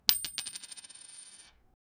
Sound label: coin bounces off the plat; gameplay